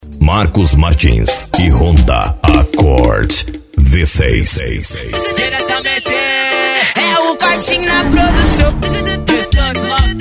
piseiro

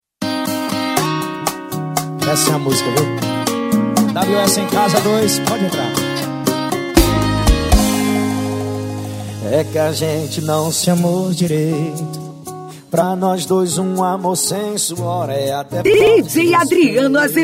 eletro funk e sertanejo

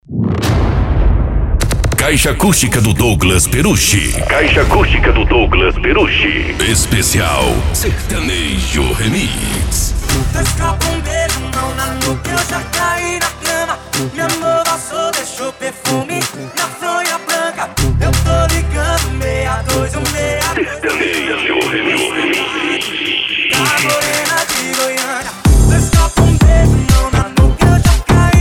sertanejo remix; abertura

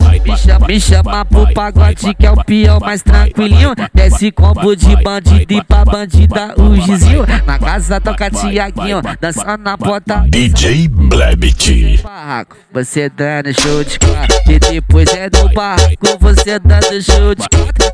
funk sertanejo

